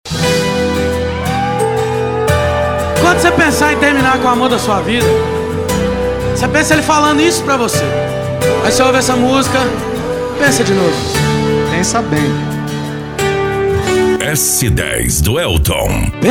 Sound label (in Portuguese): sertanejo